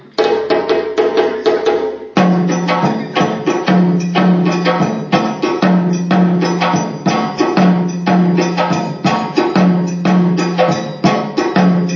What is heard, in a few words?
Sangpan